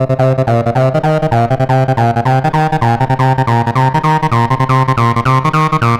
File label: Acid Loops, 160; Dance Synths; Hardcore Loops